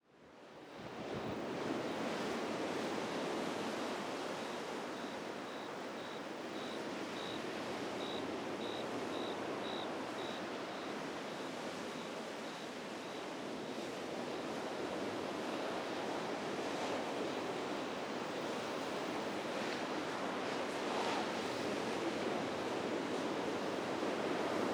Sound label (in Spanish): AMB EXT ATARDECER LAGO Maldonado UY Deck a orillas de lago grillos